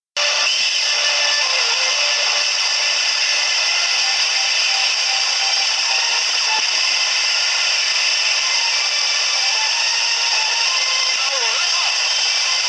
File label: bad sound